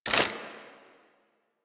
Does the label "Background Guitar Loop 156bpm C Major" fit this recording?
No